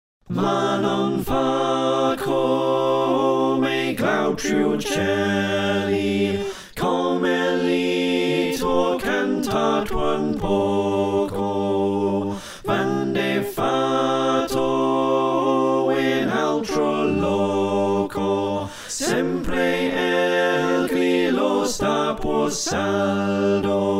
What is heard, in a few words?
Slow SATB, choir